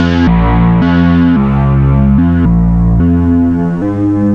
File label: Guitar Based; Eclectic Electronica